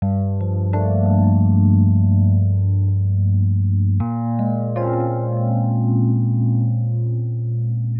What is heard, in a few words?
120 Rhodes Chords G, Keys, Nu Jazz House; PowerFX